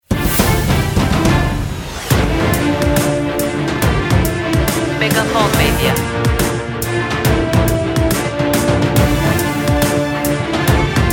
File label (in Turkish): TV PROGRAM JENERIK; BENGUTURK